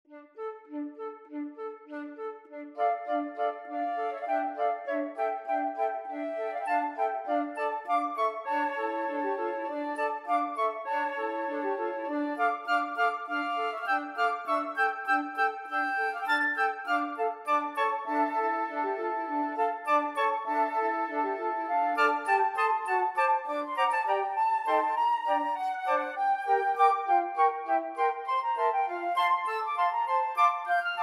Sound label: flute choir